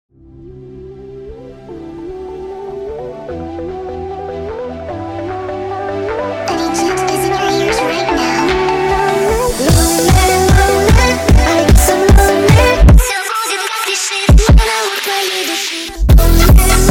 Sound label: Brazilian Funk sped Up